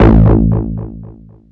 attack, BASS, Techno Trance

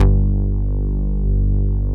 ANAM MOOG; Greytsounds; Scott Peers Sound Engineering

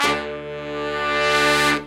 BRS LONG, R&R Horns, Sect; Roland L-CDX-03

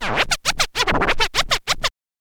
scratch kit, vinyl; scratches; Masterbits; Vinyl Attack

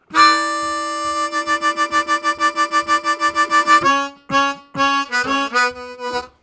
Long Vamping, Harmonica; Zero G

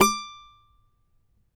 CAVAQUINHO D, Guitars; Spectrasonics; Hans Zimmer Guitars VOL-2

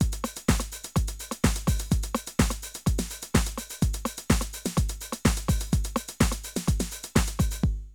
drum loops, House & Garage

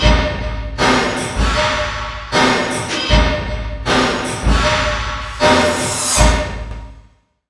XENON END R, Industrial Loops

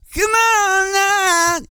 REGGAE, Vocal Planet World Voices

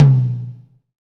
TOM TOM, Roland

